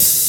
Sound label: HH, Dance Mega Drums; Best Service; HH HIP